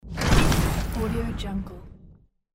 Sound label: heavy rocket launcher missile fire